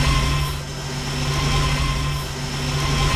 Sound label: damage hits explosions, Warships